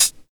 Good Closed Hi Hat Sound A Key, hihat sample tuned to the A note